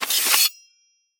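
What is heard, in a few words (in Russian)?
скрип острого лезвия мачете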